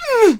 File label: Harvester Attack Vocals